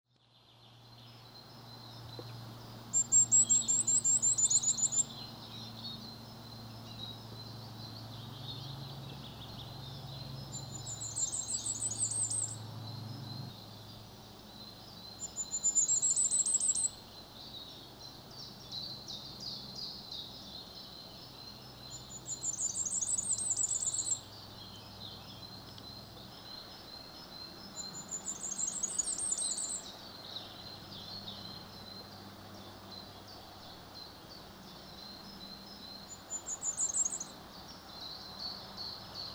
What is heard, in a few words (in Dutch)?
Vuurgoudhaan